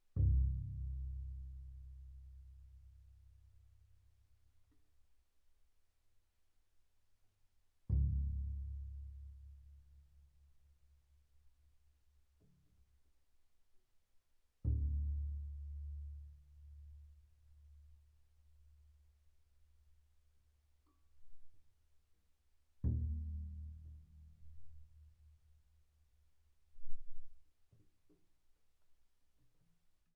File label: Bass pizz sul C pp C1 Eb1, double bass